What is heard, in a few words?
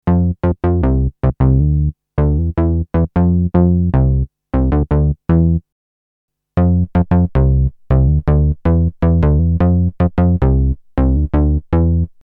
SH2 Simple Bass